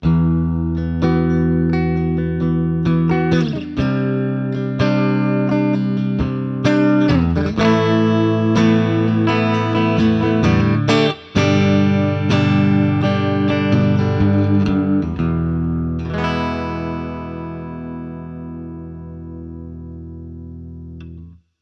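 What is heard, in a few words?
Squier Clean Both Pickups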